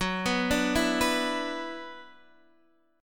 Gb 7th Suspended 4th Sharp 5th Gb Guitar DADGAD

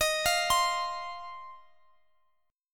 C Minor Double Flat 5th D sharp

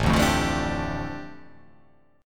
A 7th Suspended 4th Sharp 5th, A, down

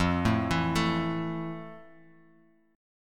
A 5th F, guitar chord

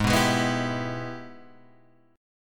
Minor Major 7th Sharp 5th, Guitar, Open G; Gsharp; 6,4,6,4,5,5

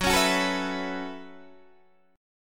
Gb Minor 11th Gb Guitar DADGAD; 16,16,16,14,14,14; down; chord shape

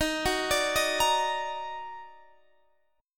Eb Minor Major 7th Eb down Guitar Standard, chord; EbmM7; arpeggiated; JGuitar

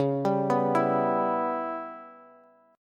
Db Minor 7th Flat 5th Db down Bass; arpeggiated

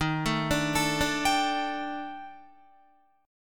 Eb Major 7th Flat 5th Eb down Guitar; EbM7b5; arpeggiated; JGuitar

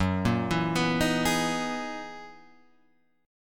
Gb 13th Gb down Guitar Half Step Down

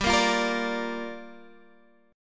G Minor 7th, Guitar, Standard; Gm7 strummed; JGuitar

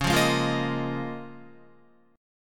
C sharp 6th Add 9th C sharp down Guitar; strummed

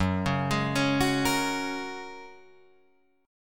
F sharp Major Add 9th, Guitar Drop D

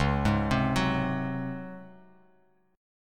Db Major 7th Suspended 4th; arpeggiated; JGuitar

